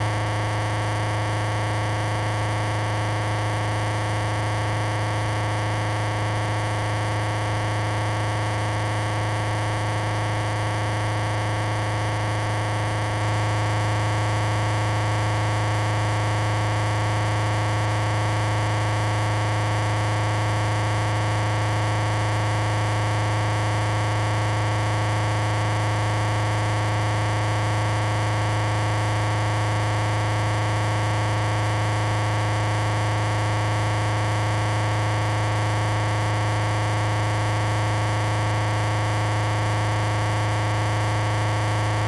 harsh noise feedback loop; sonniss gdc

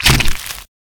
zombie massacre head smash; corpses

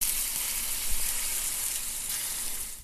sizzling

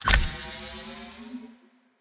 PPC Charge; lancer weapon fx

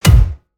Hammer Impact; lancer weapon fx

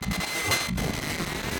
ui glitch noise glitch

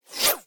tiny transitions; sonniss gdc

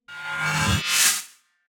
whoosh transition; sonniss gdc

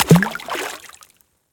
water splash small; sonniss gdc

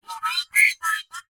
flexatone voiced talk; sonniss gdc

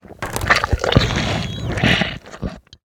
organic texture; bluezone; sonniss gdc

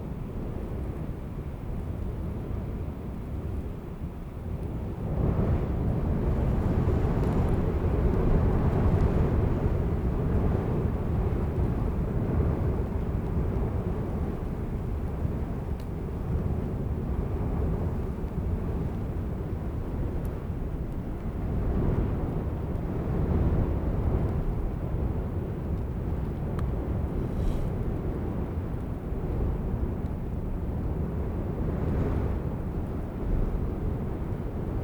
badlands loop, ambiences; Michael Ghelfi; RPG Ambiences Vol. 6 (Short loops)

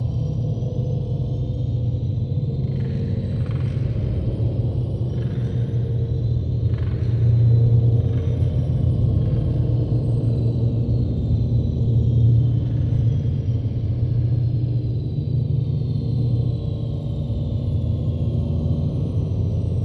abstract ambiences; sonniss gdc

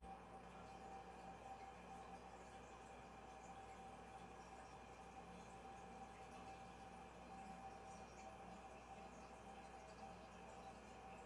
ambisonic int bathroom; fvtt sonniss gdc audio

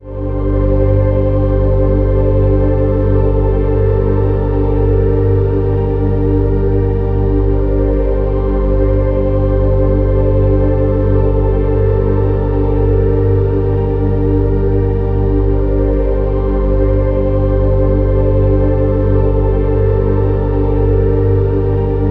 ambient synth melodic texture; sonniss gdc

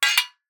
metal ringout; mkh; fvtt sonniss gdc audio

